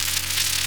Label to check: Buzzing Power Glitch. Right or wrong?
Right